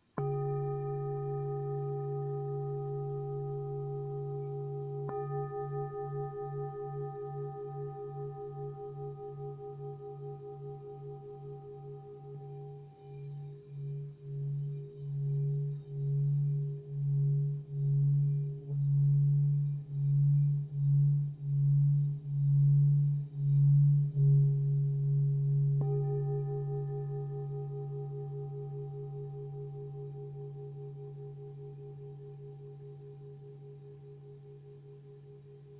mercurio, cuencos tibetanos

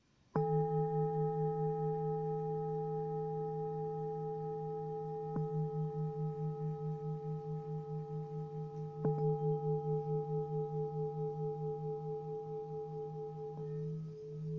thado peque 105, cuencos tibetanos

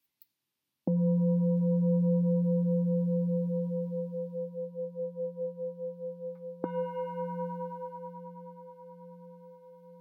174, cuencos tibetanos

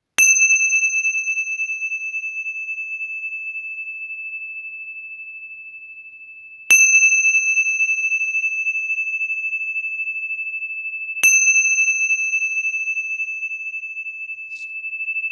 Lisos mi 7cm 238g, cuencos tibetanos

